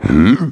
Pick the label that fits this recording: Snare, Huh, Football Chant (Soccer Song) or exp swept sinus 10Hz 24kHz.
Huh